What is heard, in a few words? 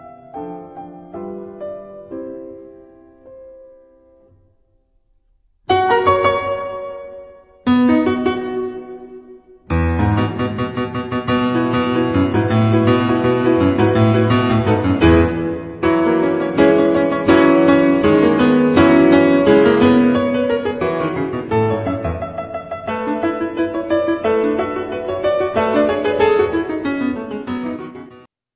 rondo on slovak folk tunes